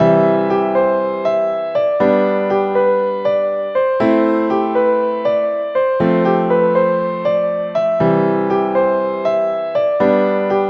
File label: Piano Loop